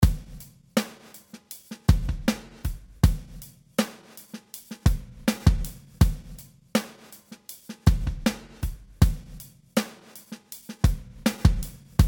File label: xform plus 49 percent